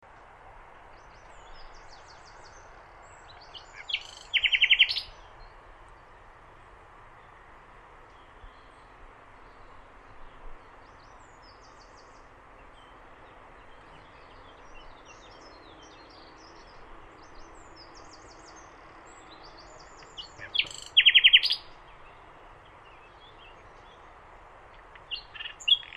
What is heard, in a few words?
Luscinia megarhynchos; SETE